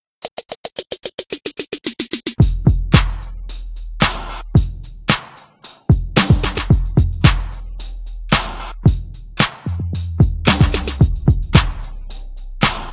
drums

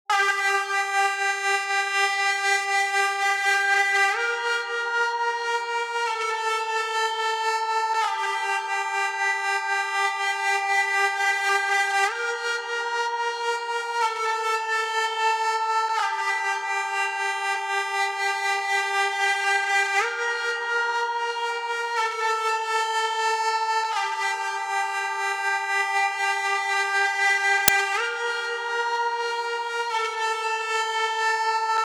Ebow

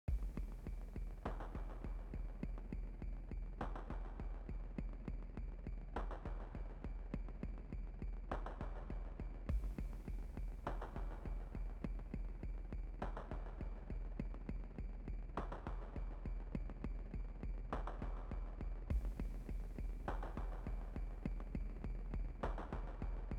all percussion

